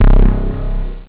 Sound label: TRANC BAS; SYNTHIS